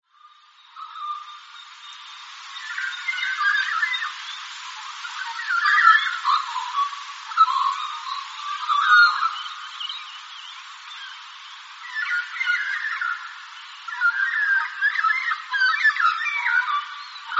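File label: Australian Magpie carol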